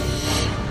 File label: Decloak; trek